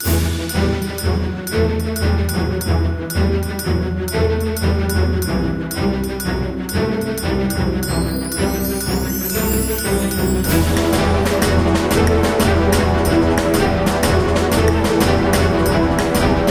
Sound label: bgm battle; witch spring 3 android ios mobile gamerip 2017